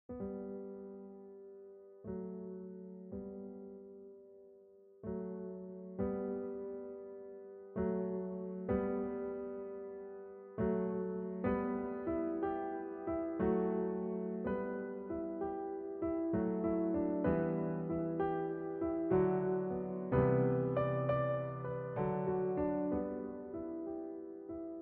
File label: Butterfly Jig Traditional Irish